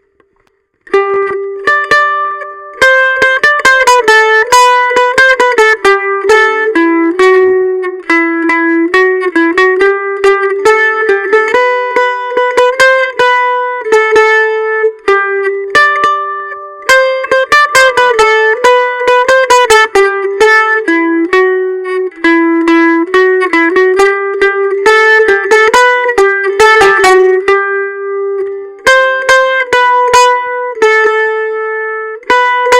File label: mandolin; straight-up recording of me playing